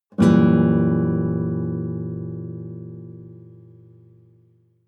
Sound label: nylon guitar chord full diminished